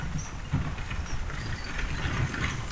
Horse Drawn Wagon By; Electa Quinney

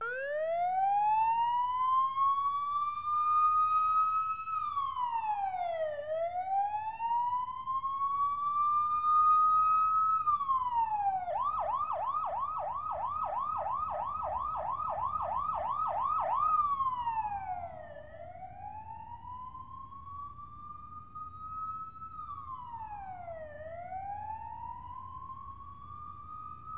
Dist Police Siren; Poetry Project

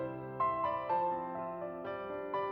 Music Only

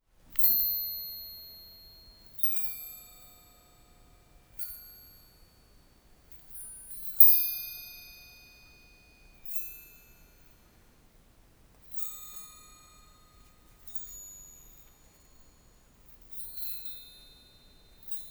INT Magical Chime

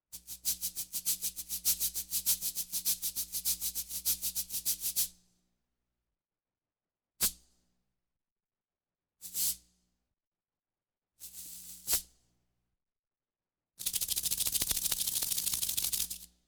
Cabassas Accent Rhythm; Electa Quinney

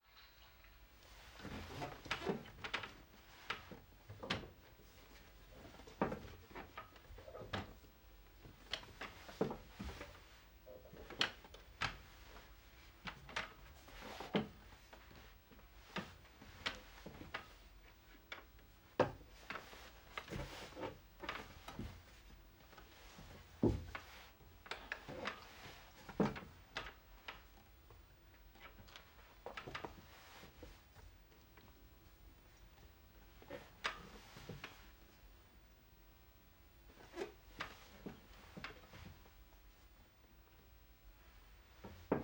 Classroom, Presence, Moves, Chair Creaks; Universal SFX

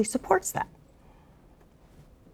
Birds

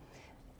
Birds

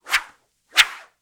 Whooshes, Arrow, Feather, Airy; Universal SFX

